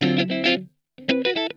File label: STEAMIN, Funk Guitar; Zero G - Funk Guitar